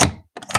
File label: door handle open; phasmophobia